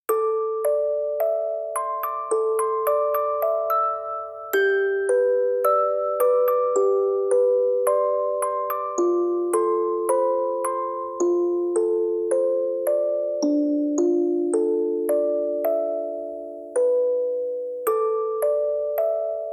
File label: Music Box; ALTDEUS Beyond Chronos Original Soundtrack